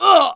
hit, pain; mohaa

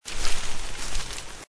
birds rustle bush; amb; mohaa

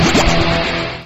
spawn; cdogs

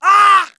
hit, pain; mohaa

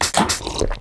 soda can; items; xash3d fwgs; induction

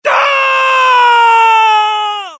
death; mohaa; dialogue

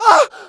hit, pain; mohaa

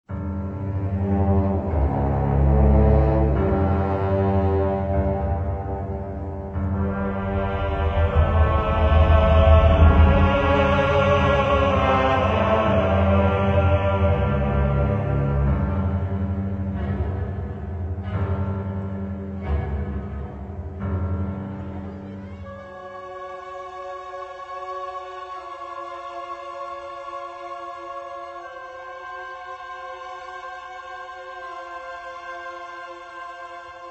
music; singe; Secret Of Nimh

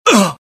death, pain; mohaa; dialogue

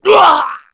death; mohaa; dialogue

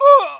hit, pain; mohaa

